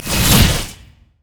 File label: deadly bloom; LoL; zyra